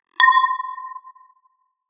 verre

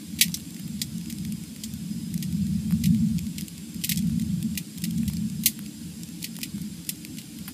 feu mix

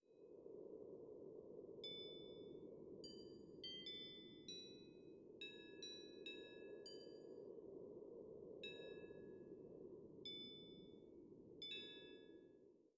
carillon Vent

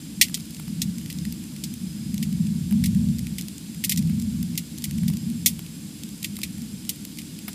feu mix

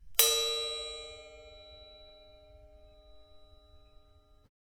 sept maj

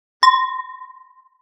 verre

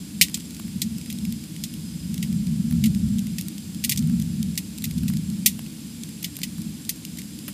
feu mix

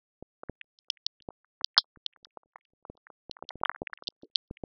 cailloux

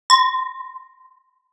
verre

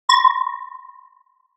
verre

